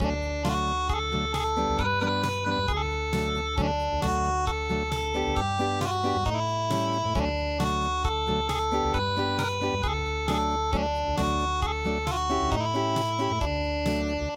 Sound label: Coulters Candy Unplugged